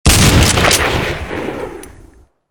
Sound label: M3 gunshot